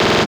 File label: machine gun; Total Control - Action Cutz